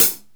clear pedal hi hat sound c key; tuned drums

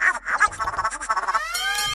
funny indistinctive alarm

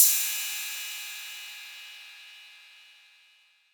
crash cymbal sound f key

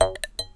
jaw harp recorded live

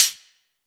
verby pedal hi hat one shot f sharp key; hat samples

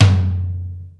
Large Room Tom Drum F# Key; tom drum samples; one shots

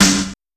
treble heavy acoustic snare g key; snare drum samples

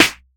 steel snare drum sample g key; snare drum samples

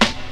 raw steel snare drum g key

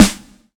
subtle reverb snare sound g sharp

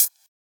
smooth hi hat B key; drum single hits one shots

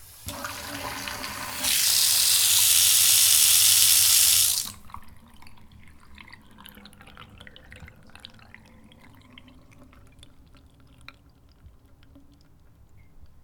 bathroom shower tap draining down the pipe

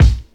sharp hip hop kick drum a sharp key; Duration 0:01; Samplerate 44100 Hz; Channels Mono

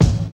present steel kick drum sample a key; kick drum samples

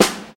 studio snare drum sound f key; snare-drum-samples

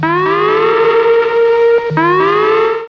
large alarm bleep

